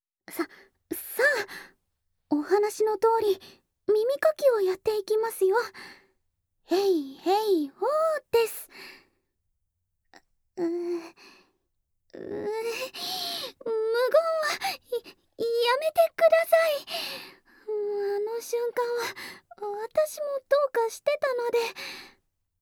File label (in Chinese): 電子噪音掏耳（右）; 雷電の魔女のビリビリスパークヒーリング; CV.高柳知葉